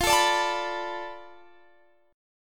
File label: B Minor 7th Flat 5th F, guitar chord